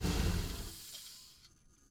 vehicle suv turnoff; teardown; ps5 windows xbox series xs

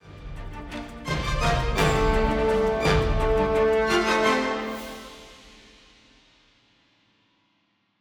Combat; Syria

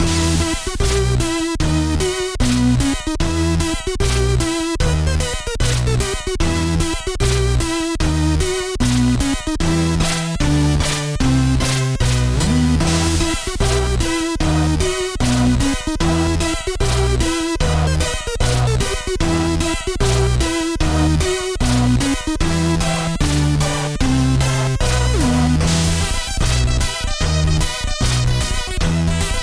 music forest loop; Skellboy

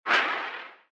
Star Throw

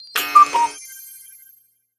Evening Jingle; Style Savvy Trendsetters; Nintendo 3DS

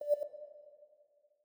Move E6 Mf; Mixolumia

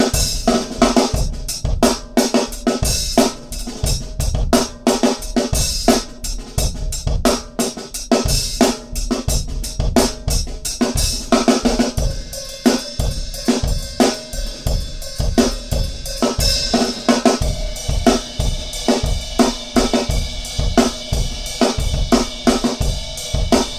subway play for tips drums; The Sims 3; windows gamerip 2009

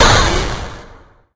Necro Witch-Damage

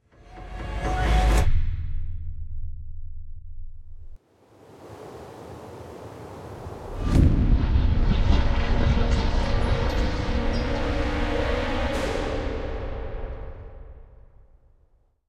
Startup; Crush; ps4 switch windows gamerip 2017

